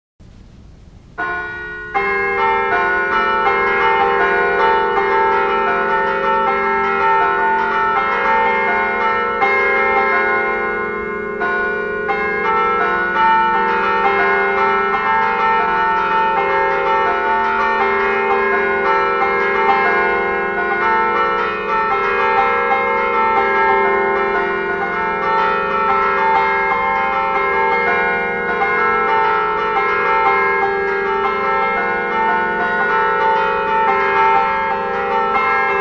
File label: Improvisation, Carillon; Carillon de Lens